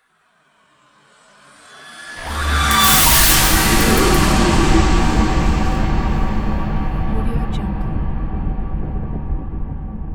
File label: cinematic horror